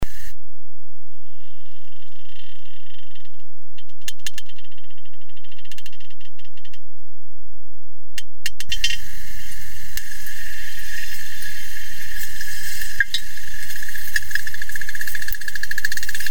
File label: Underwater Sound Crayfish